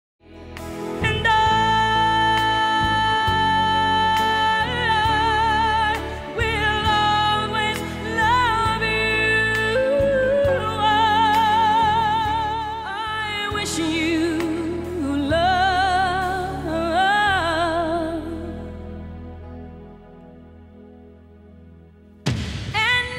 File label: music